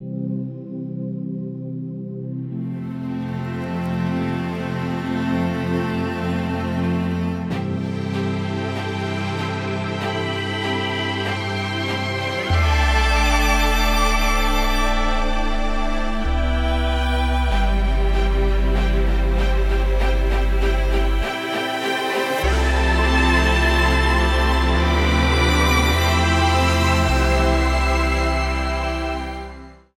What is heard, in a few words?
Water Flow Klong